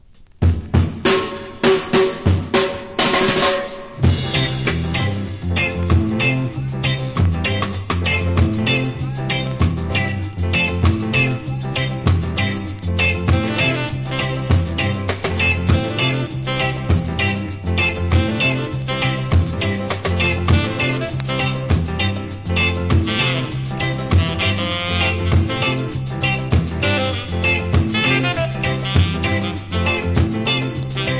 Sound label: reggae limbo